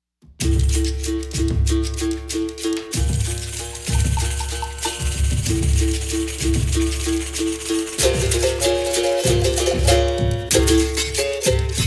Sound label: berimbau